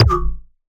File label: perc